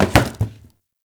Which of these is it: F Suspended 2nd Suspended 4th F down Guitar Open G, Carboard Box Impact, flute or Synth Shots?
Carboard Box Impact